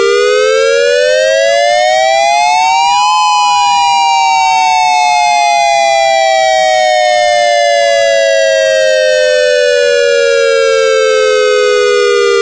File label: ELEC WAIL, Siren